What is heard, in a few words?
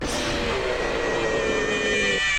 jumpscare; one night at springtrap 3